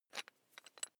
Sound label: electric drill bit fasten wrench turn very fast